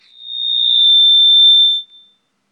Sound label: Mic Feedback Short High